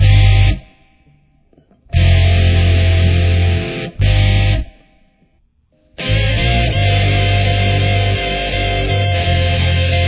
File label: full pre, guitar